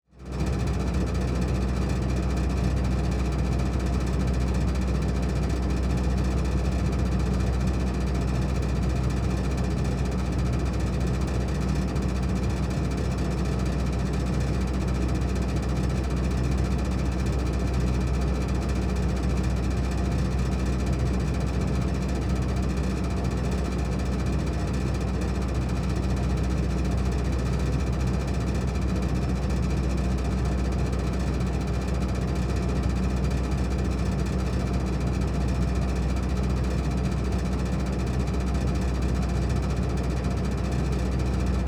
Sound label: Asmr Refrigerator Noise